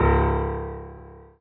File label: Piano Ff